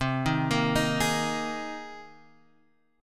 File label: A sharp 7th Suspended 2nd Suspended 4th, guitar chord